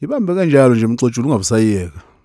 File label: Vocal Chirp, African Voices